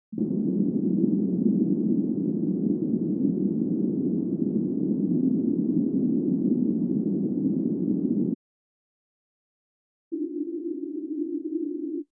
200hz, 250hz, 315hz -20／-16db L／R [l 1／3oct． Band Noise, R 1／1oct． Band Noise]; Denon Audio Technical CD